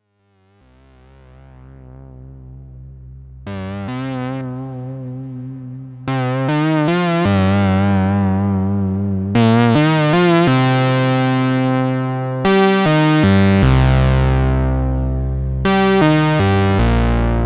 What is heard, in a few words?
low SAW lead, synthesizer